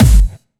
Clubby Kicks, Bassdrums

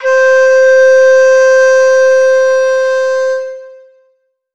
Flute 2 - C; Instrument Oneshots; 99Sounds; Monosounds

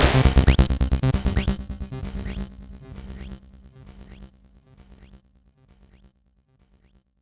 Acid Echo; Sonic Foundry (Sony Creative Software); Ugly Remnants VOL-2

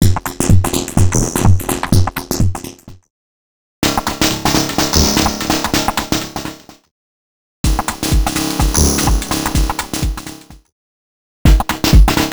House 126bpm; X-Static Goldmine 5

